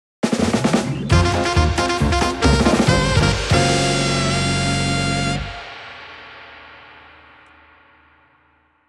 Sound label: Power Activation; Advance Wars 1+2 Re-Boot Camp